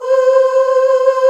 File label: Soul Ooh C3; Proteus 2000; Digital Sound Factory Proteus 2000